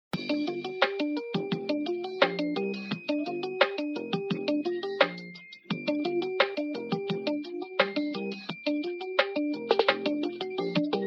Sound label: instrumental